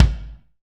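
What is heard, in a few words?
bass drum, Roland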